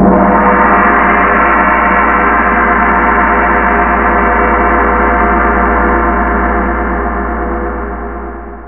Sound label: slower gong